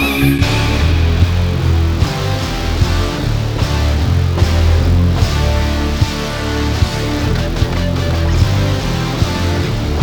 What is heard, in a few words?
Basses